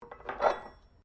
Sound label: Cutlery general pick up, Household cutlery; Kitchen; SoundEffects Foundation2